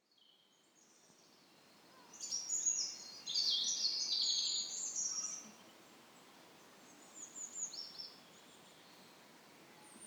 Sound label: May Peaceful Forest